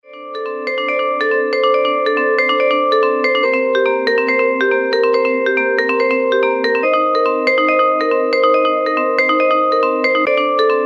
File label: vibes cool ringtone